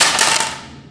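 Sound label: physics clipboard impact medium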